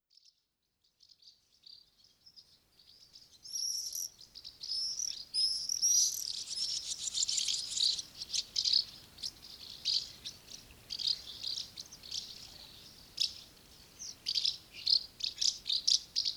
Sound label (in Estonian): piiritaja